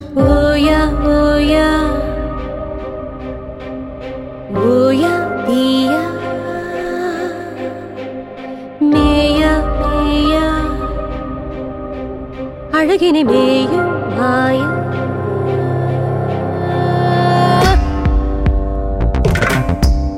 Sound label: tamil tune ringtone